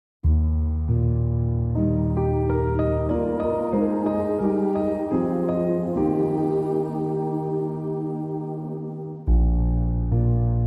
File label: Piano Choir and Tinkerbells